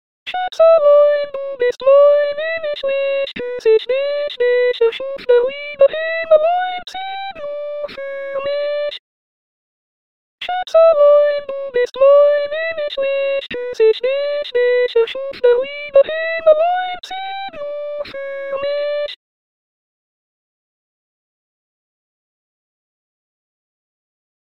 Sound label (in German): Zigeunerlieder soprano